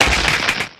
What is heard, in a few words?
mined tree; mining; yumako; Factorio